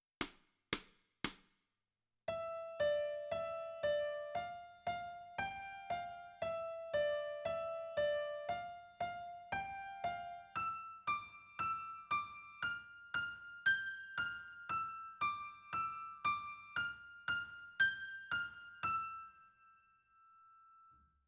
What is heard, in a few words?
Piano